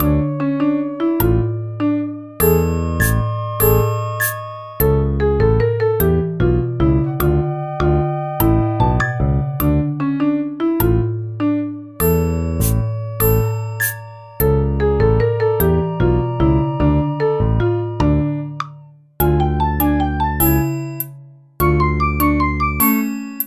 Ghost; nintendo game seminar 2014 doki doki letter relay; wii u

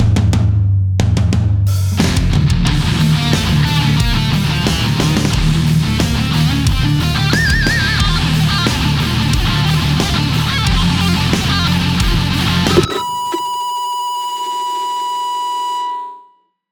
Metal Track Mix; Fortnite

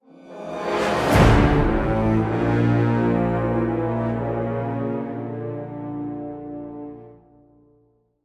Boss Lose

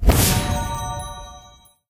Magic Portal Exit; Angry Birds Seasons

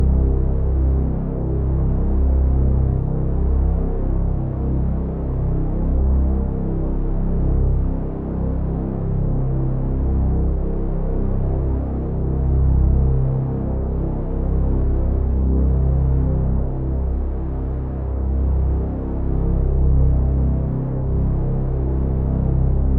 Low Bass Show Ship Loop; Void Bastards

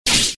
Sfx Knight Attack Hit

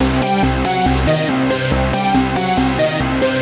Bleep Bloop loop; Slinki

